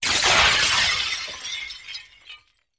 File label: Glass Crashes Design; windows & mirrors